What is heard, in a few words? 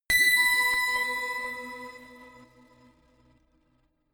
notification glockenspiel crazy single; instrumente